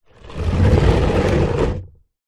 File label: industrial bucket plastic slide hard ground